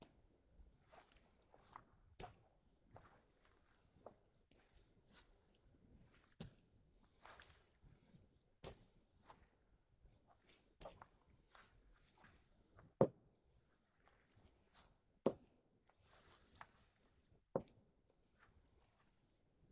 Foley Footsteps Concrete Sneaker Walk Very Slow Creep Distance